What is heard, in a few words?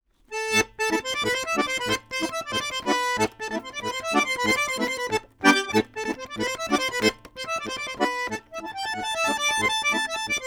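domra, Neumann TLM170; garmonika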